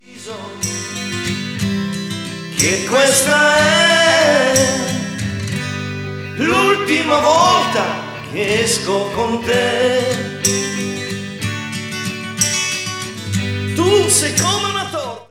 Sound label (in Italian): nuova versione